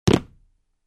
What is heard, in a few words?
Jump on the wooden floor with shoes; steps